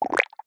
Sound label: cartoon pop mouth; zapsplat